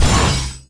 ui add Skill

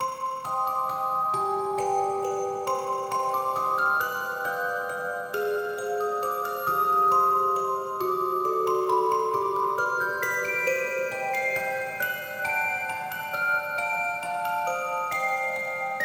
Ambience Music Box; Five Nights at Freddys; FNAF Special Delivery; android; Ballora

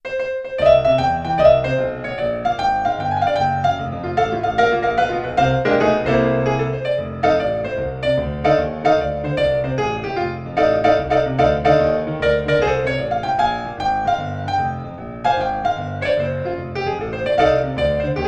subway play for tips keys; The Sims 3; Windows

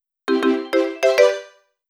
Jingle Made a Friend

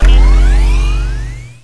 reload energy Chamber

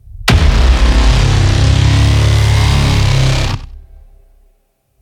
Hit; Monitor

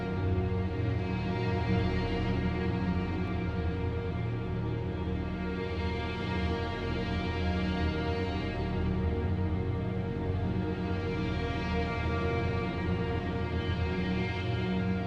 MUSIC Predator Noisy; SideStory

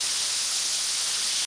Jet Tiny; Learn to Fly 3

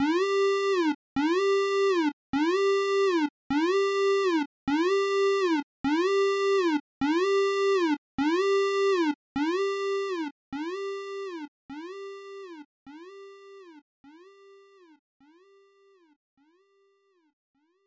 Alarm

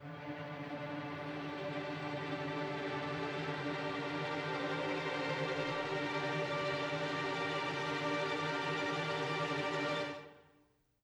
String; Far Cry

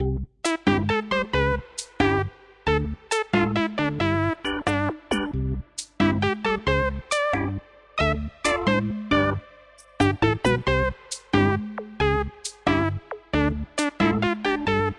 Cheerful Bgm; Controlmode

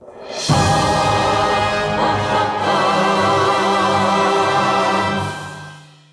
Goal Fanfare; crash bandicoot series sound box; Rings of Uranus; 3rd Place

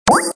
Button Sound; 31 Minutos Memorice; Windows

